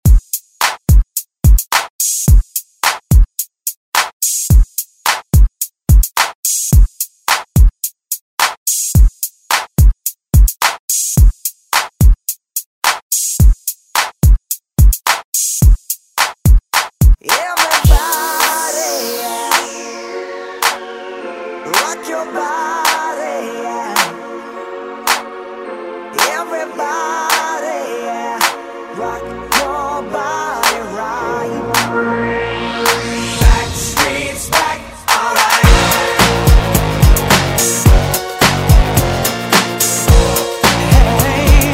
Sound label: 2000s Redrum